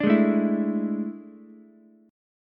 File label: A Major 7th Suspended 4th, Ukulele; strummed